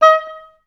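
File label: oboes staccato D#4, orchestra; Best Service; Peter Siedlaczek's Advanced Orchestra; OBS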